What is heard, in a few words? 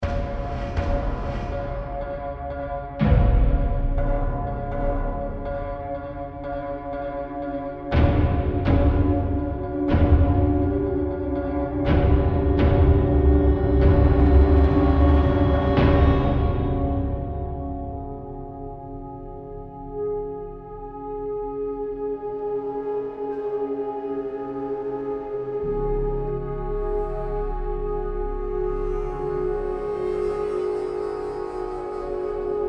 Tension Combat; AC Tension 2 Combat Alternate 2